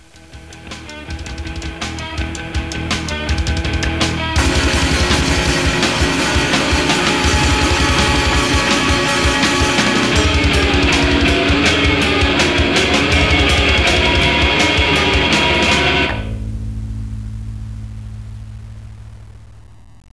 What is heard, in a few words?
songs techno